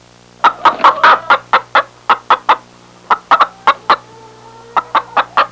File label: Hens clucking